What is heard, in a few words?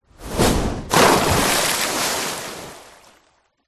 impact object fall whoosh into water splash